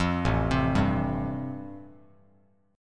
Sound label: A-Minor 7th Sharp 5th, Bass Standard, chord; Am7#5 arpeggiated; 13,0,10,0; JGuitar